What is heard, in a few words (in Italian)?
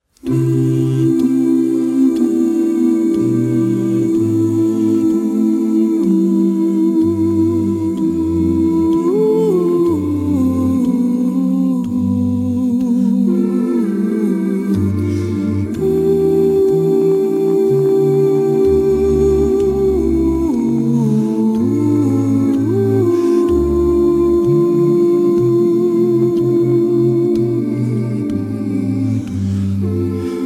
coro a cappella